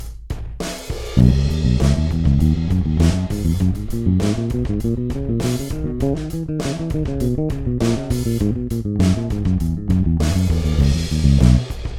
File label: bass eq